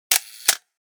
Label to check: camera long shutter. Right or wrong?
Right